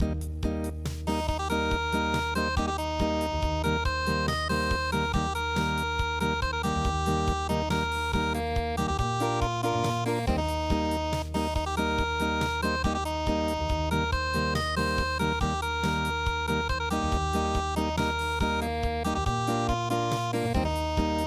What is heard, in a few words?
Barbara Allen unpluged